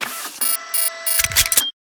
scanner; activities star cores; CosmicRage

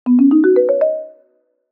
bingo board; CosmicRage; CosmicRageSounds

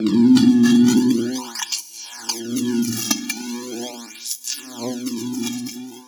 buzz; CosmicRage; CosmicRageSounds; socials

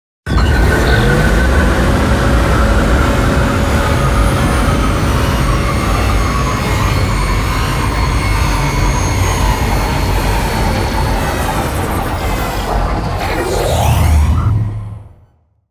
beacon landing, ships movement

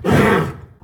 attack, horse; combat; creatures

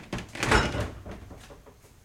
fridge open; CosmicRage

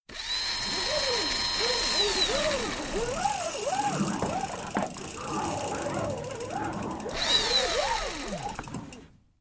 retract; ships

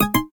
volume down; Cosmic Rage

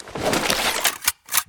holster, rocket; combat; weapons

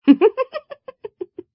giggle

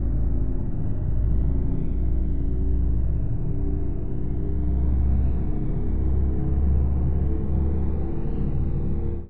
quan time start, ships movement

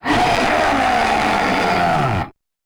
taunt, creatures; combat; ryuchi; CosmicRage

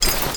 build, ships; CosmicRage; ratika

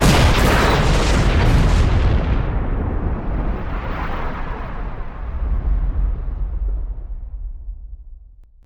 other destroyed, ships combat; CosmicRage; CosmicRageSounds

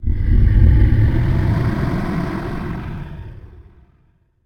low growl, dragon; combat; creatures

